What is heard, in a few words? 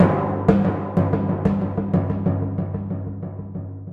Timp G